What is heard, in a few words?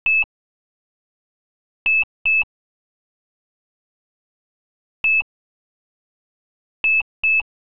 buzzer